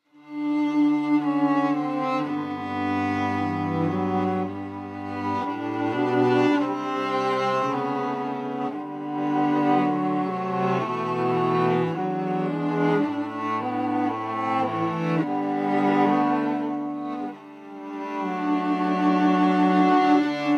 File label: celli